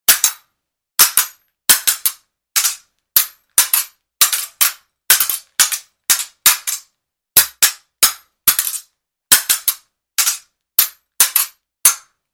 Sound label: Foil Sword Fighting Routine; Hollywood Edge Martial Arts and Human Impacts